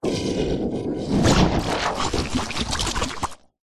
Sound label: cartoon slime big growling slimy bubbling